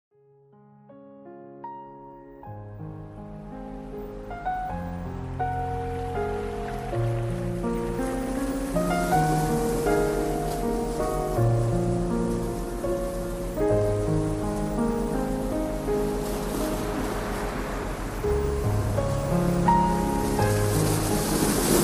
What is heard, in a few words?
relax sea